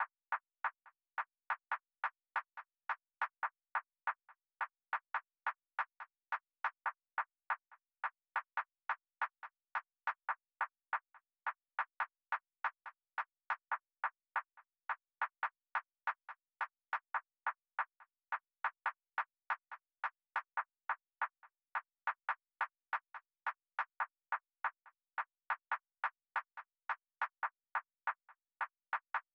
140 BPM D Min Percussion, Drill; Melodies; Loop Stems; Armed

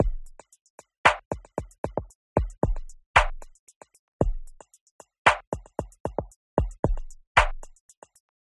Old School Perc Loop - 114 BPM; Cymatics; Fiji Hihat Loops & Percussion

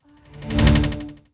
Jolt Standard

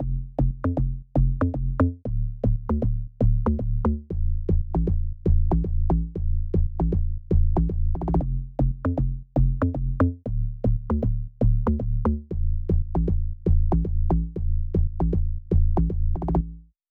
117 BPM A Min Bass, Sunset; Melodies; Loop Stems; Cymatics; GHOST (Beta Pack); Blizzard

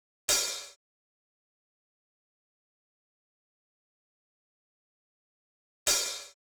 Drum Loop - 86 BPM Open Hat, Boom Bap; Bristol; Cymatics - ANALOG EVOLUTION Beta

